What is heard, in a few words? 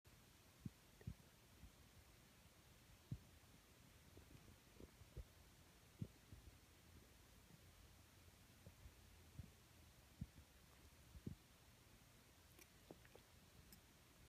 Eleven months old kittens